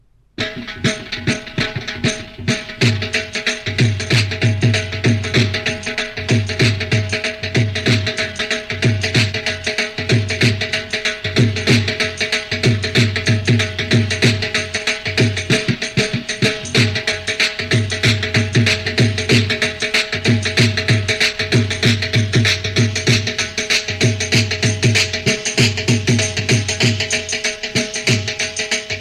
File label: bhangra music